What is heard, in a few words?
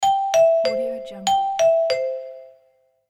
vibraphone announcement